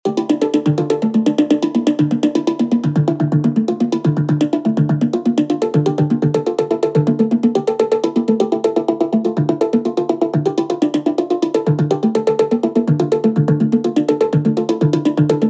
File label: Handpan Modular Dmin